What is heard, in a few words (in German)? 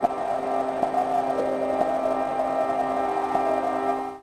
rauschen und filter, Trautonium